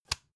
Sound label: cd cover close short